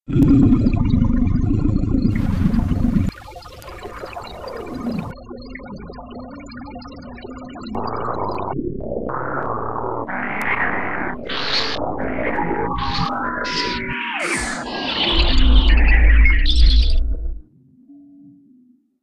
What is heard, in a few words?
Fanfare